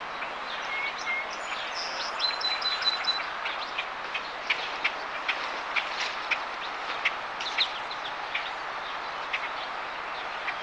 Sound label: bird